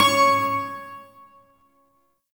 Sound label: Shawzin Oct Three C Sharp; Warframe; Duviri Shawzin